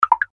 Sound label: Confirm Triple Dumb Click